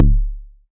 crunchy kit kk; STYLE KITS (Hip Hop, Etc)

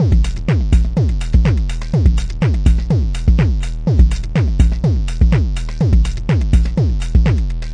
124 bpm mega kick quiet house

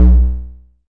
kick, drums single hits; iElectribe Kicks

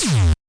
virus blonk

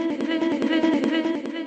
afro stutter, vocal or voxy loops

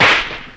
Electro FX; Ueb HE Electro FX

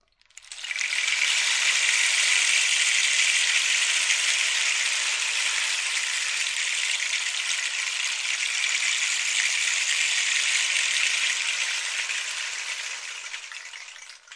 rain stick long; ETHNIC and WORLD PERCUSSION; Rainsticks

